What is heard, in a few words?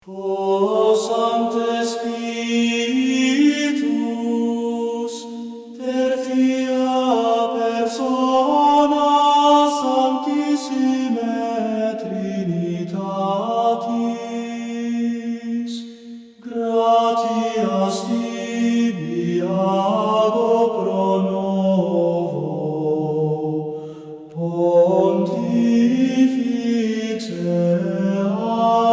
latin gregorian chant; in thanksgiving for a new pope